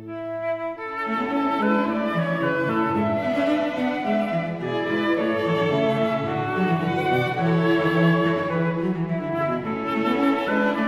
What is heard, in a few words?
flute, String Quartet; in A, K.298; Variation IV; Mozart Flute Quartet